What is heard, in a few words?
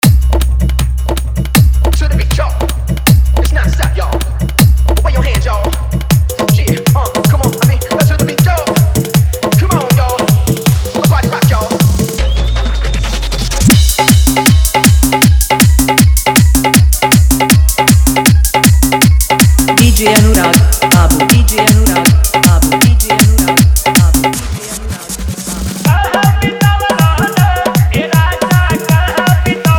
Dhollki Bass Mix